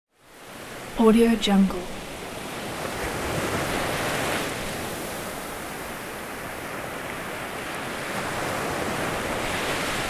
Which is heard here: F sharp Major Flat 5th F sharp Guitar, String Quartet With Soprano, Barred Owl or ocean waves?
ocean waves